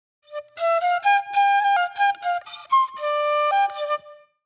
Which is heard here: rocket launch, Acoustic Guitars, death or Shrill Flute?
Shrill Flute